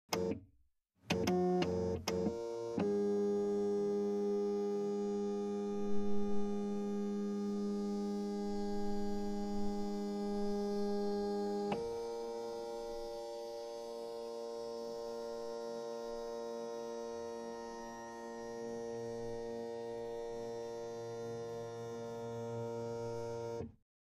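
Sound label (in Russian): scanner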